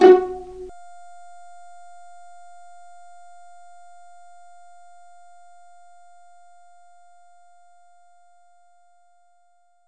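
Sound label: Pizzicato Strings F#4; knights try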